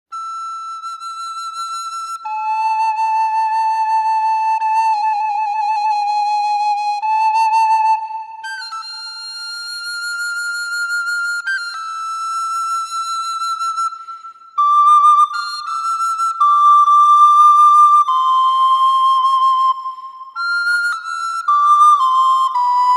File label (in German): Sopran Floete; kurz; Loeiillet